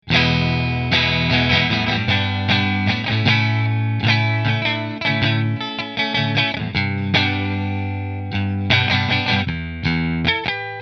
Position 3 Fender; Tonemonger

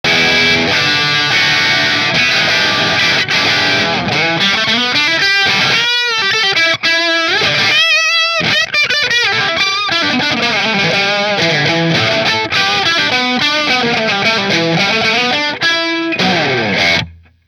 Mcnaught bridge marshall, guitars; McNaught Custom Guitars Vintage Single Cut Dark Blue

